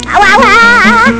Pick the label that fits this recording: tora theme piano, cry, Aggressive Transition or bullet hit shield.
cry